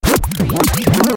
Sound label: Turntable Scratching Reverse